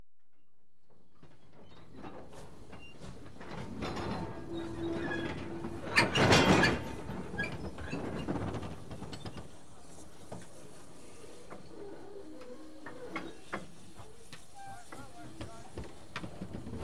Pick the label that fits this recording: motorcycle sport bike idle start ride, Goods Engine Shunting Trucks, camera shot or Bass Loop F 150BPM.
Goods Engine Shunting Trucks